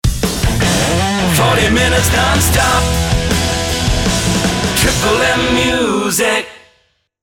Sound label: JINGLE